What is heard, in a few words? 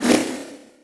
Strike Charge